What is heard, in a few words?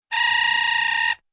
very high scratchy razz